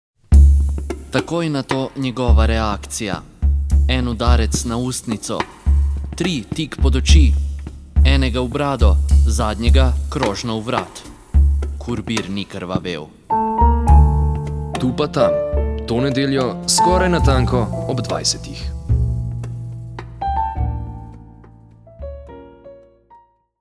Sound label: jingle